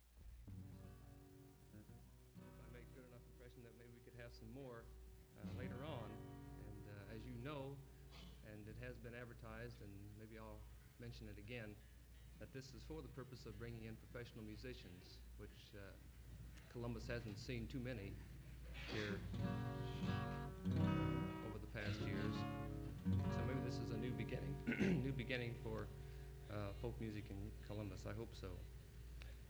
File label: Applause